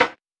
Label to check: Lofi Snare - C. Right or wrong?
Right